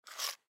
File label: Cell Telephone Pick Up Fast Cement; Blastwave